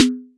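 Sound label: snare drum; TRX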